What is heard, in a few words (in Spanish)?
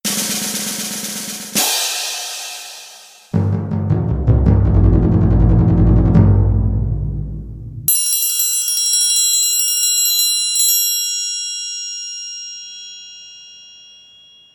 PERCU Caja, platillos, timbal, triangulo